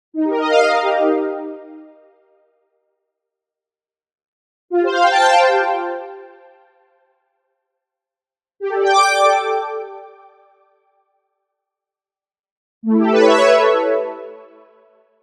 Arcade Jingle Positive; boom library; The Interface

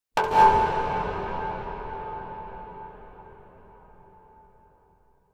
Impact Pointed Wooden Hit - Short; Hollywood Edge; sound designer's toolkit v2

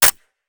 Musket Foley; Sound Ideas; Guns

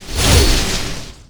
Pickup Scifi Energy; SFX Kit

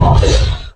MONSTER Growl Deep Short; MONSTERS & CREATURES; mono; Universal Sound FX

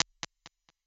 282 Delay, Impulse Responses; Reverbs; PastToFutureReverbs; SST; CLA; IRs

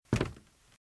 Footsteps - Wood - Creaky - Walk - Right

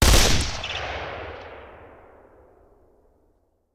Machine Gun; Sound Ideas; Guns

